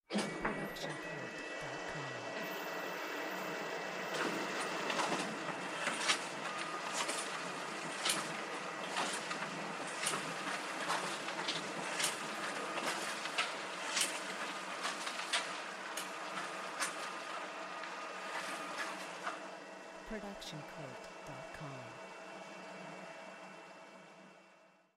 printer printing; soundscrate

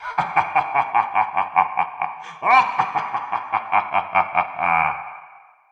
evil laugh long; soundscrate

